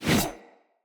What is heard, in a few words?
Axe Whoosh; Weapons; Enemy; Axes; Glades Axe